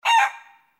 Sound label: chicken; farm